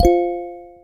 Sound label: Kalimba